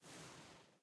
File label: combo; match3